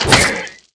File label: NPC Killed